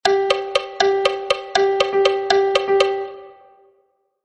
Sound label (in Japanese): 2連符6／8拍子